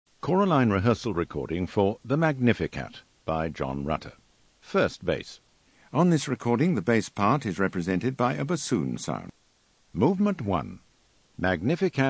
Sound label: Bass; Rutter Magnificat